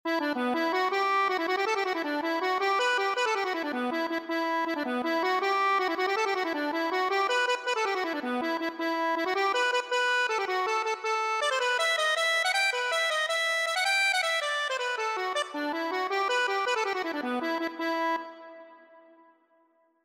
okane, accordion

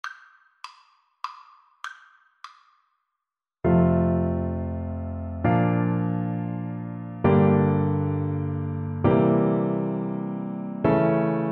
we wish, Alto Recorder

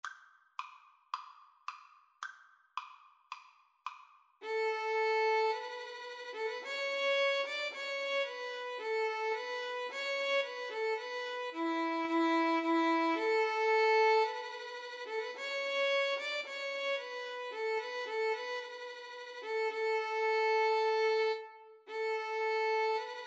trumpet voluntary violin

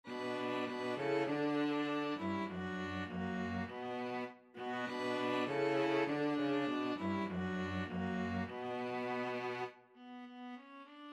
string trio

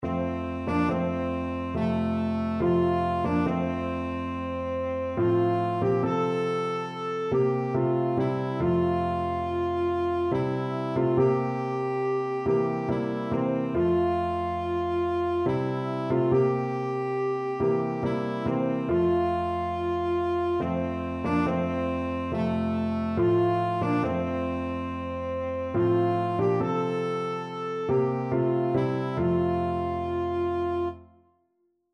tenor saxophone

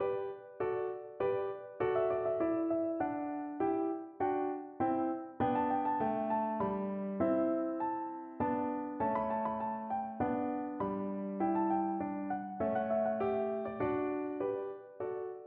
minuet, piano

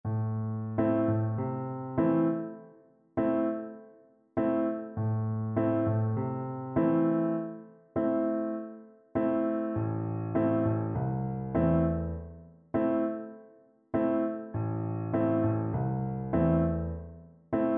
Oboe

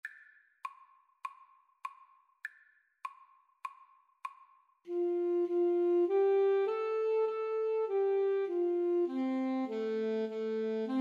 joyful joyful 2 A SAX

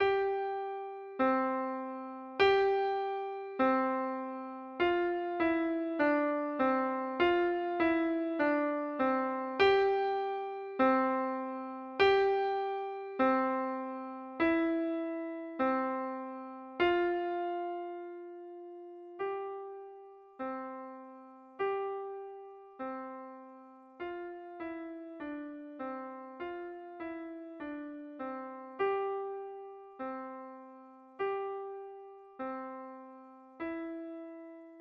bells in snow, piano

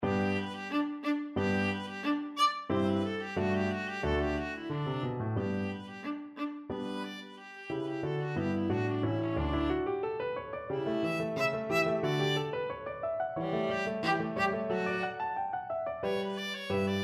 clementi sonatina op 36, viola

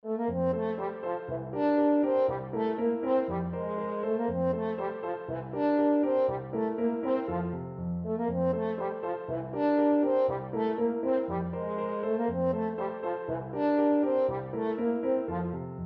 french horn

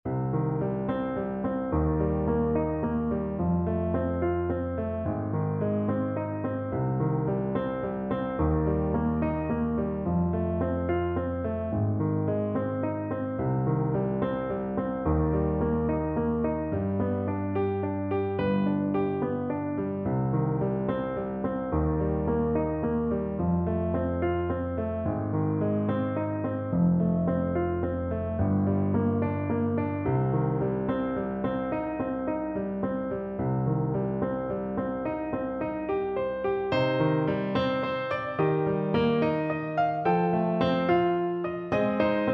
House of the rising sun, Bassoon

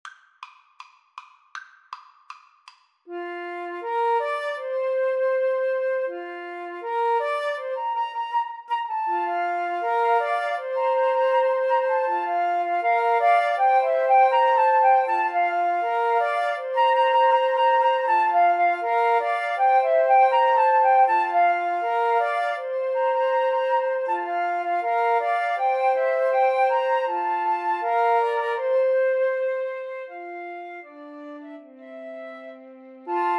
la bamba, Flute Trio

